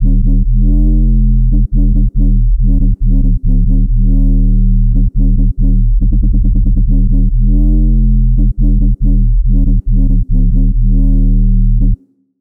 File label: 140 BPM D# Min Bass, UK Garage; Melodies; Loop Stems; Cymatics; GHOST (Beta Pack); Speaker